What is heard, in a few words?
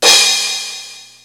Crash, Roland D-110; Drum Samples